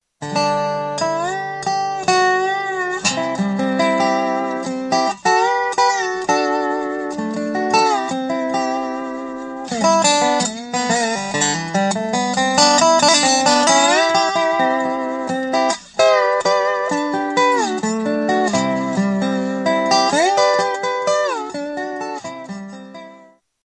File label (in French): guitare resonator; instruments